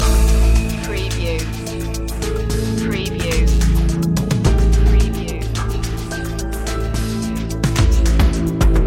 loop, chill

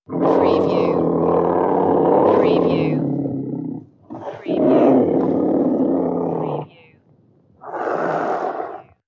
Leopard Black Growl Hiss

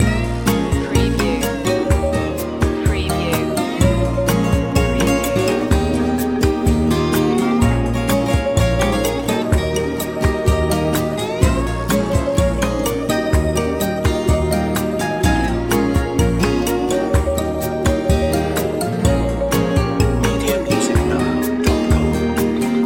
calypso night loop

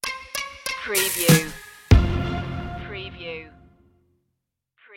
stoned rap stinger

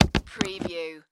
Sports Bowling Bag Set Down

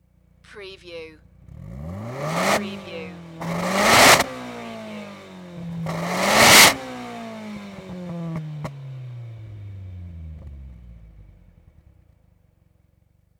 NISSAN SILVIA EXHAUST TRIPLE REVS

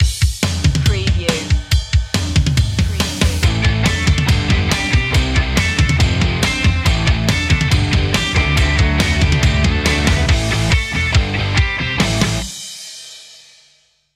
rock story

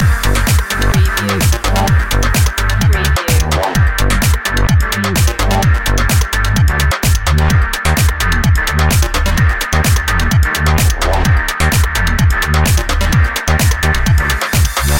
stiliobeat loop

